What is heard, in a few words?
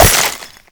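icicle break large; Explosions; Call of Duty Modern Warfare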